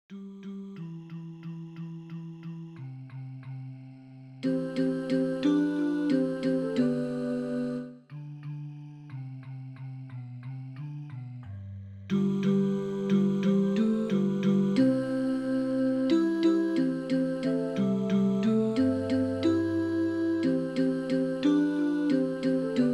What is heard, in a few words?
Tenor